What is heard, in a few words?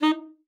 D#4, ALTOSAX; Xsample VOL-2